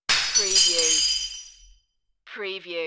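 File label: Small Plate Break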